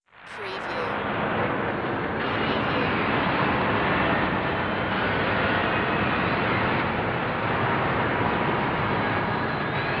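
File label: Production Element Bed Chaos; sound-effects-bible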